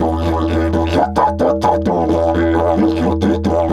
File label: E 130, Didgeridoo